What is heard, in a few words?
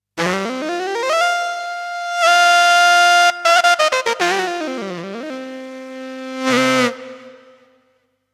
Lite Alto fuzz